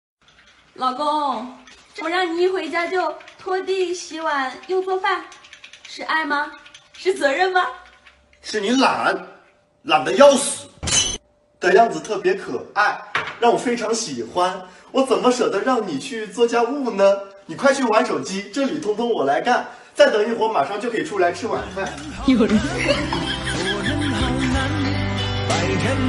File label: laugh; breadradio